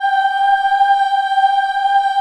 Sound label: G4 CHI OH -R, Choir; USB Soundscan vol.28; Acoustic & Synth; AKAI